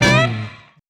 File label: shout, stabs